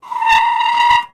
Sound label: squeal; best service Blue Box; mixed sounds short